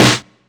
noisy snare drum b key

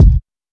warm steel kick drum sample e key; kick drum samples

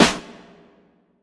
low snare drum sample a sharp key

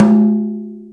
mid tom drum single hit g sharp

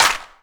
hand clap sample E key; percussion; single hits one shots

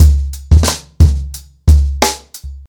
120 bpm breakbeat c sharp key

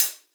sparkly open high hat g key; Open hi-hat one shot; drum single hits one shots

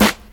original snare drum f sharp key

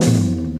60s high tom drum sound g key

